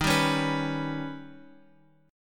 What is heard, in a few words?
D sharp Augmented 7th; D#+7 Chord {X 6 5 6 X 7} strummed